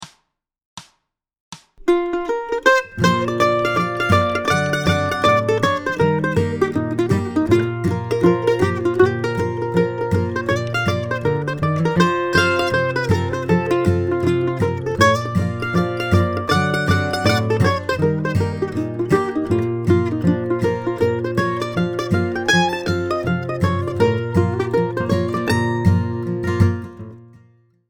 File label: mandolin